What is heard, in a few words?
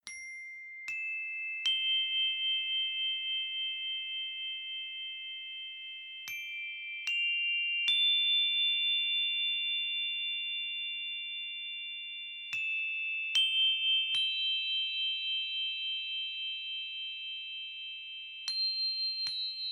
Elfen Chakra Chimes